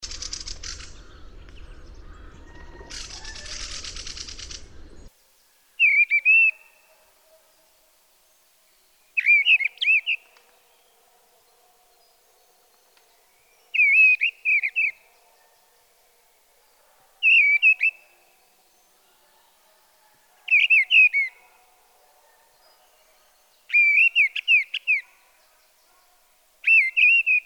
Grive draine, chants oiseaux

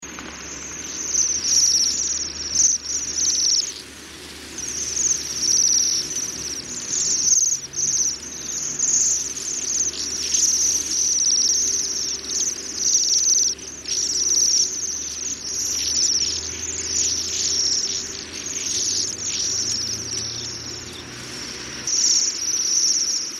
Jaseur boreal

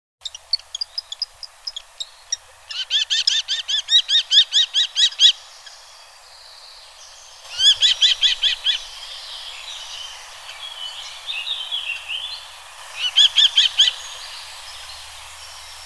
Faucon crecerelle

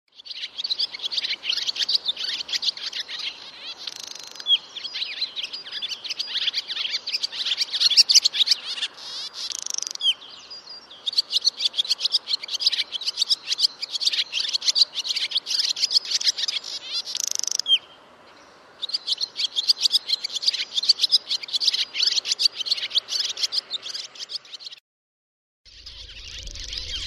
Hirondelle rustique

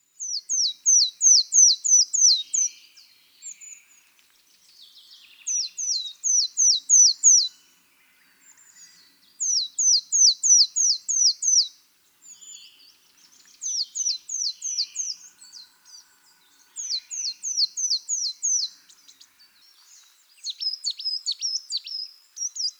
Mesange noire

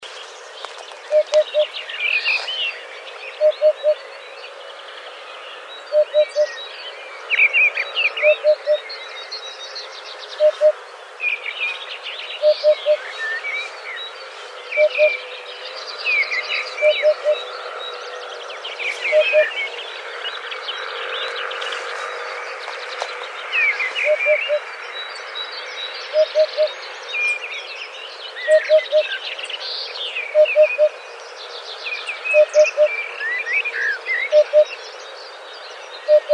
Huppe fasciee

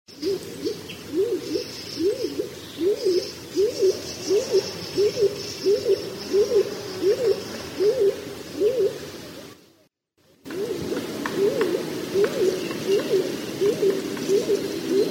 Pigeon colombin, chants oiseaux

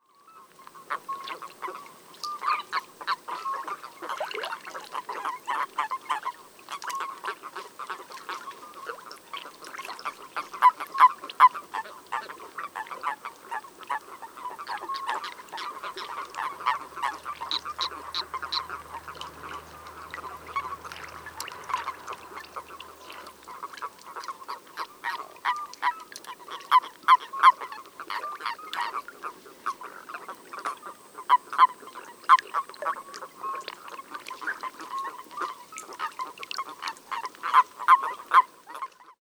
Crapaud commun

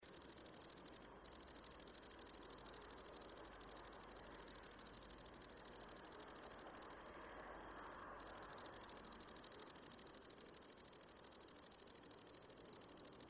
Grande sauterelle

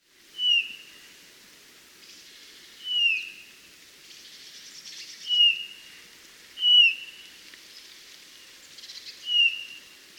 Bouvreuil pivoine

